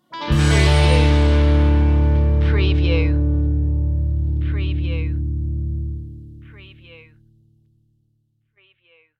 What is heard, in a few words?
lonesome ranger stinger